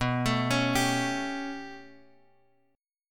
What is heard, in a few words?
B 7th Suspended 2nd Sharp 5th B down Guitar Drop D